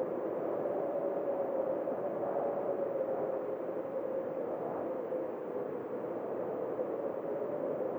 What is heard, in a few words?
Fly Forward